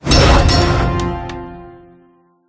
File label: building finish; sfgame